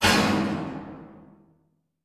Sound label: door impact